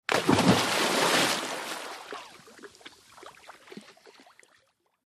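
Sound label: Splash Large Foam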